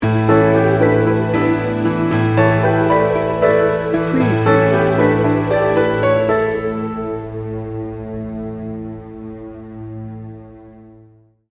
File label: breezy summer day sting bumper